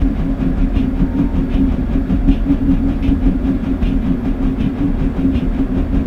medium fan loop

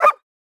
whimper; Rust

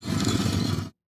door metal sliding close start; Rust

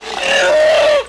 Elephant Die Vox; Civ4

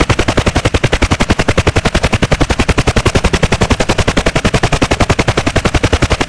Aircrafts Engines Rotor UH1H; DCS

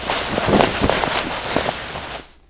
beartrap clothing rustle

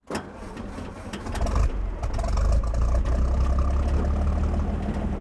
engines; World of Tanks

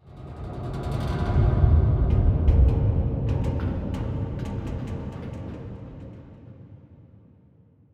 submarines engines; World Of Warships

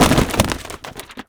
bookcase collapse

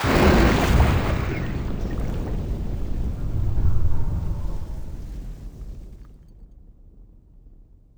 airframe water crash, damage; DCS

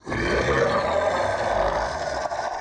ship engines; World Of Warships

